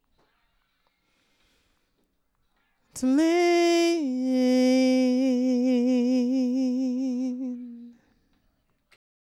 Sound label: epic diva